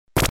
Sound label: small Sweeper Sound